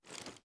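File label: charge reload end, pistol; gmod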